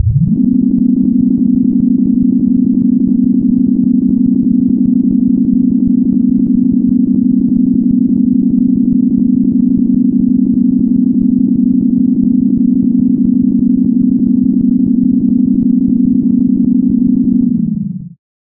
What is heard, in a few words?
long elevator; undertale